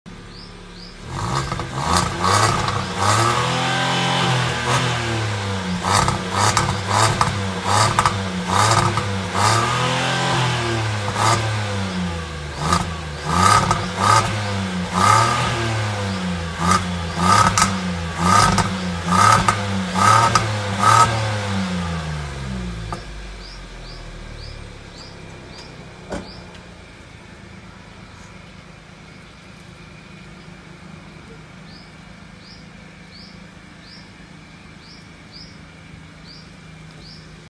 Datsun GO; ماشین

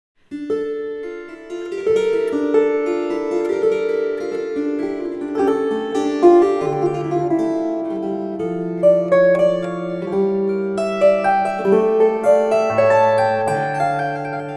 Kantele; کانتله

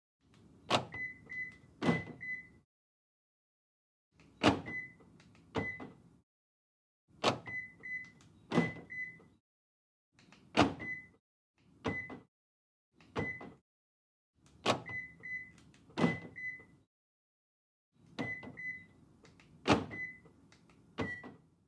Prison laser door

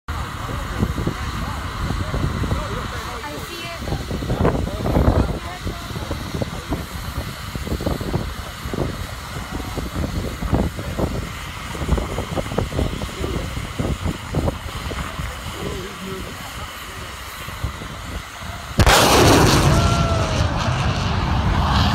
Breaking sound barrier; شکستن دیوار صوتی

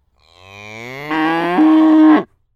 cow sound

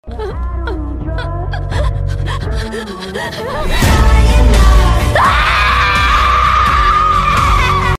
Anime screams; فریاد های انیمه ای

